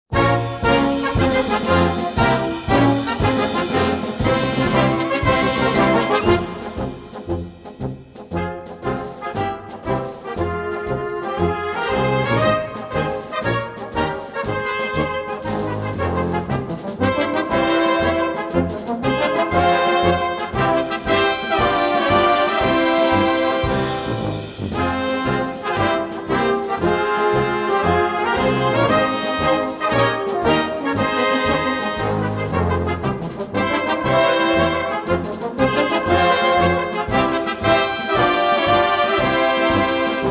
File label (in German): Marsch